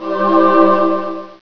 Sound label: priest spell; Exile III v1.0